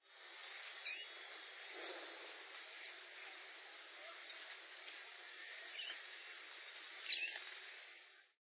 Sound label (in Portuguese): sai-andorinha